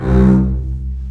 CBS C2 MARCATO, String Collection; East West - The Ultimate String Collection

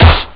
sdrum; tristar; Amiga Soundtracker Sample Packs

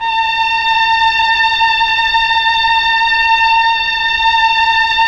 STR, Melo. Strings, Tron Strings; Roland LCDP09 Keys of the 60s and 70s 1

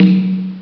m1 bongo; ST-XX Amiga Soundtracker Sample Packs

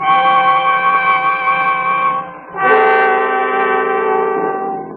Ancient Music FX, Industrial

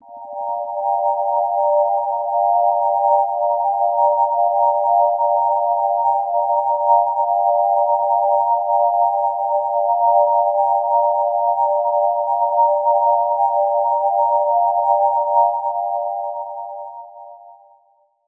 Pad D#3, club techno

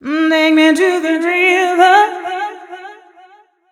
f 126 mel f-sharp, fem Vocals

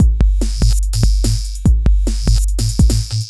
Beat, Drums & Percussion; Electrocution; Beats

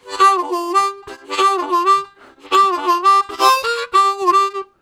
Pushy, Harmonica Riffs; Zero G - Harmonica

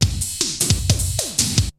BREAKBEATS, TECHNO

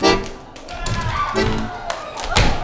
ACCORDEON; Best Service; World Colours; ACCORDEON+ST

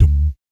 BS DMM, Vocal Planet Jazz & FX; ILIO; BASS DUMMS

